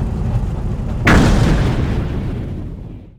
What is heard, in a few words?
factory Land Complete, ships Movement; Cosmic Rage; The Experience-light